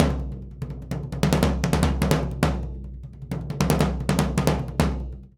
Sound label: 100 SURDO; Univers Sons; Basicussions